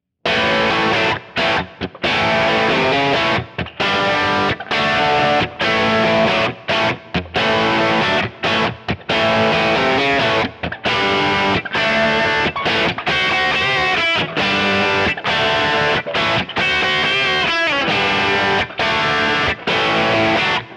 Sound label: harley benton st 62 mn vw